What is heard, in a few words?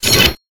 weapon pickup piercing